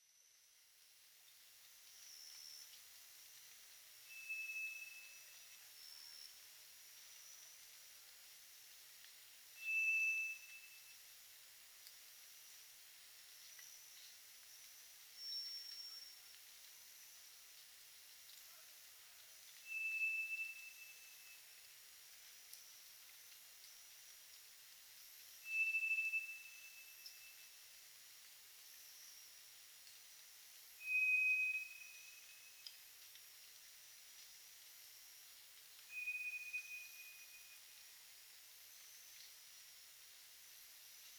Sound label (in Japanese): toratugumi